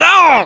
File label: pain; ModernWarfare; localized english